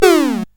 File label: Dark Castle, CSG8580R5